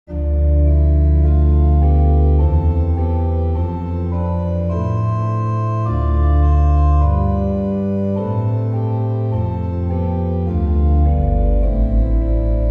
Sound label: Dorian Song, organ; Giubiasco